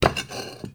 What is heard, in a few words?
Vase Medium Slide; Objects; Vases